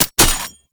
Bayonet Mech; Warframe; Tenno

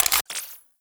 Blastor Pistol Mech

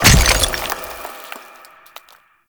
Stone Pillar Destroy; Ambience; MITW; Warframe; Duviri; Murmur

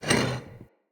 Vase Medium Slide; Vases

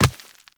Walk Rock, Footsteps; Operator

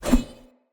Short Sword Whoosh; Weapons; Swords; Sollos

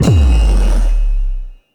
Backstab Cast Start; Warframe; PaxDuviricus

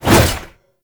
Staff Swing Sweetener; Warframe

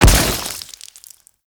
Modular Secondary Barrel Explosion, Weapons; SU Modular Guns; Barrels

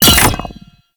Grenade Bounce; Warframe; Lasrian Soldiers; Weapons; Lasrian Goonadier Grenade

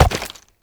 Step Rock; Warframe; Uriel

